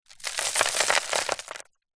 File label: dirt fine dust handful drop sprinkle wood; zapsplat; foley